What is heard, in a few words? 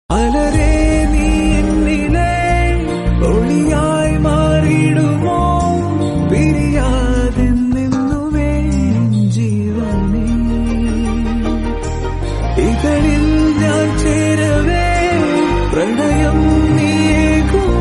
Malayalam Ringtone